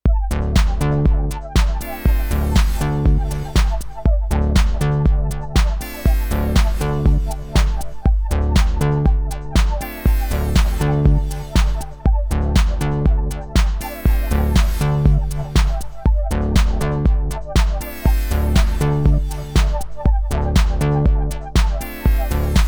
Crane Song Interstellar Track DCS 904 Filter

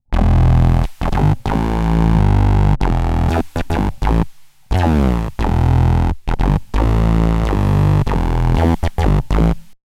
Electro Harmonix Bass Mono Synth cosmic

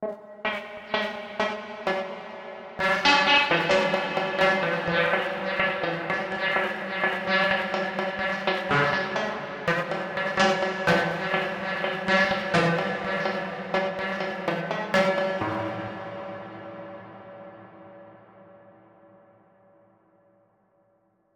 Percussion Stretched; Sunhouse Sensory

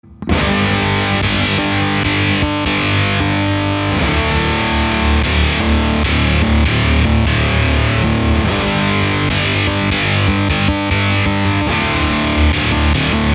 Stone Deaf Rise n Shine Green Mode Momentary

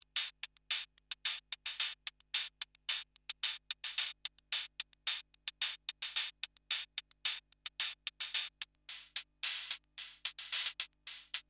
HiHats Cymbals

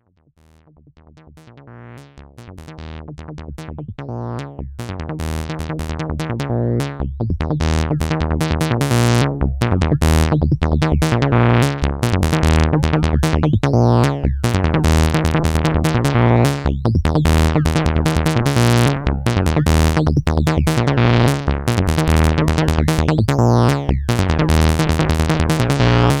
A110 Sync Sequ

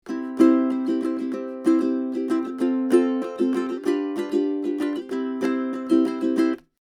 Austrian Audio OC818 Ukulele 40cm

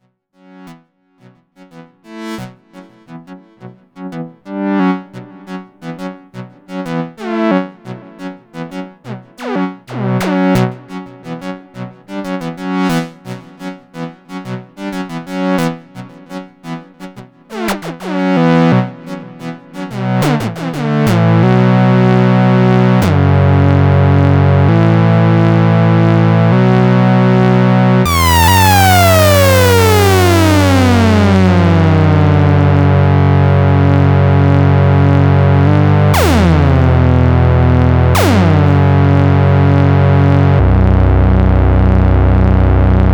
spectrum2 OSC2 mod by ENV

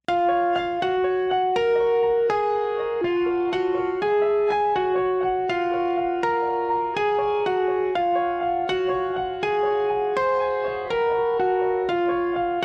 Piano

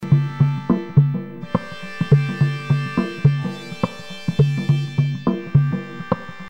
Rotary Speaker

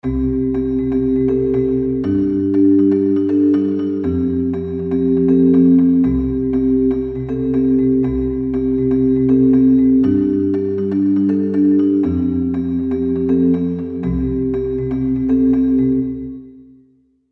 Electric Grand Synth Pop Celeste Plate; EZkeys

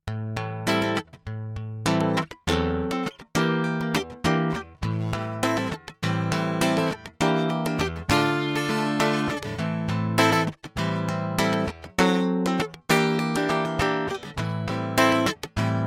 Acoustic Guitar Rig

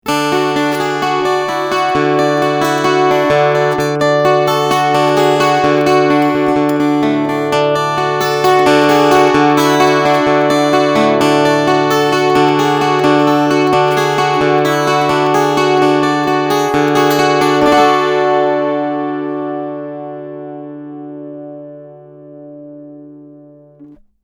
Picking EQ Half

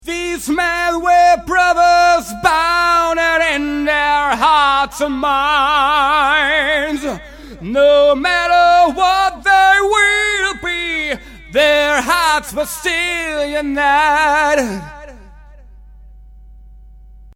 Reverb Delay

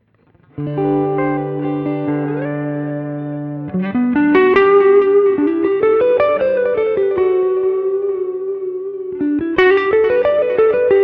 Clean Neck

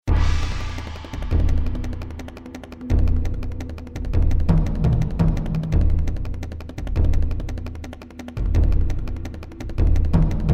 performance grooves 170 bpm

